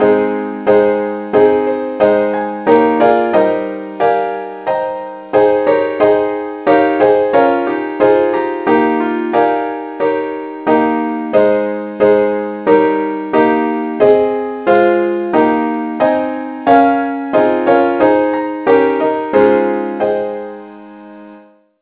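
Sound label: key a flat; saintthomas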